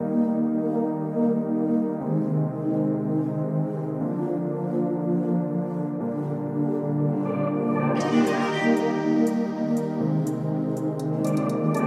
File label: telephone hold